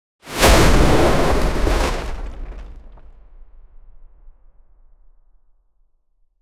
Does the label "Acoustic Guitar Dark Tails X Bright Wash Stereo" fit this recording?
No